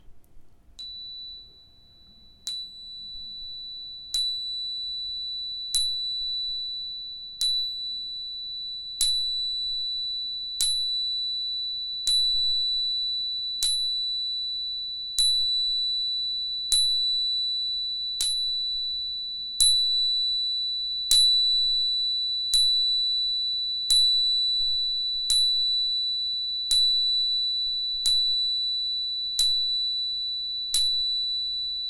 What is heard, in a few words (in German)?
Klangstab